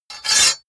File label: sword cut hard scrape short